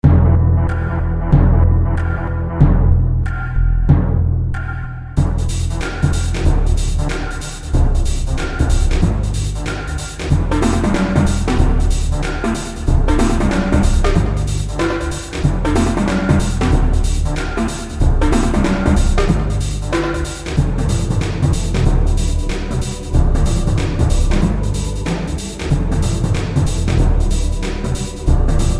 Mines Ambient; Super Skelemania ps4 switch windows gamerip 2017

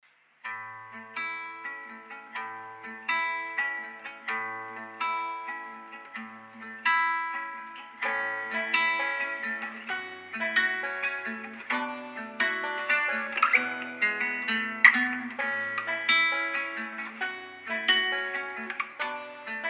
Happy tune; Lost in Play

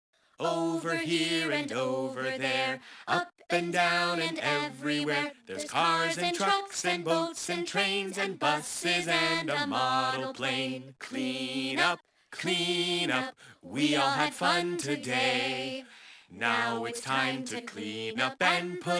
Song Vocals Cleanup song; cocomelon play with jj; android

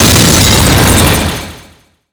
Object Building; BOMBERGIRL RAINBOW; Arcade

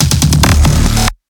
transition; Doom; VEGA Core

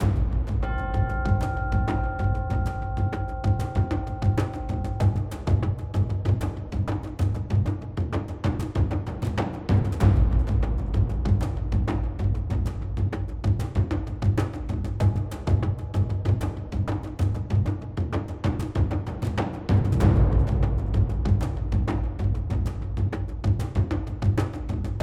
Combat Drums; Phantom Doctrine; ps4 switch windows xbox one gamerip 2018

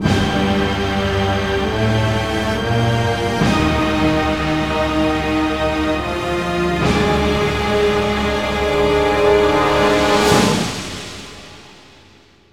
Fail; Heroes Over Europe

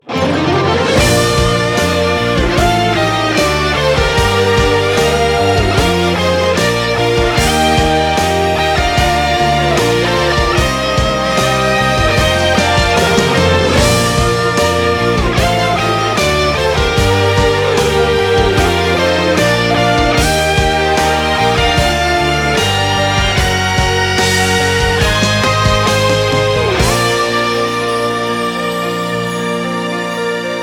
Music Event

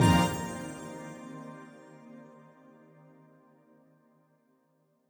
Jingles PING; Semblance

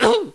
goblin hit

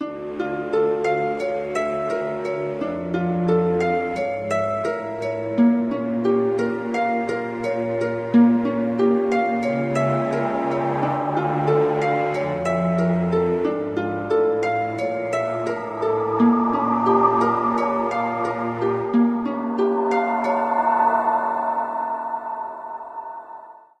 Harp Loop

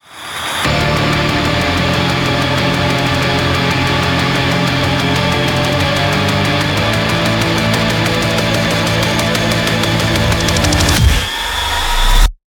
Heavy; Doom; Transistor Fist

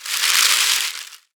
streamers; Birthday Party Bash; Wii

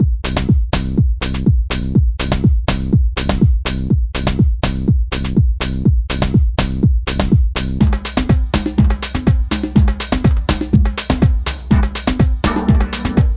Base Dance Simple

